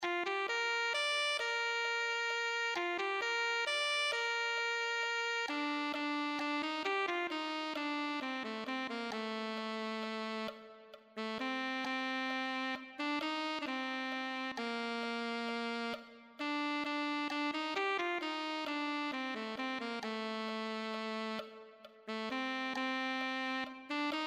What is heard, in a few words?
alto saxophone